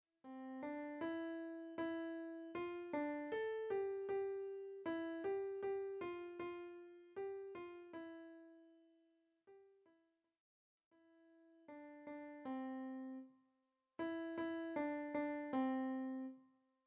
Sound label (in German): Wiegenlieder Klavier